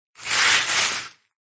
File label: foley debris very small stones scoop up